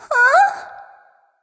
confused; smite; voicepack

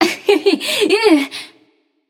"yay"; smite; voicepack; nuwa

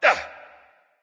grunt attack bow; smite; voicepack; ullr

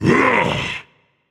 battle cry; smite; ahmuzencab

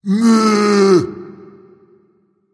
tongue, voice; Smite; ymir skin6

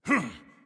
grunts; smite; voicepack; hercules

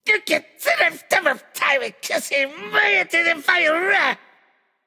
grumble; fafnir

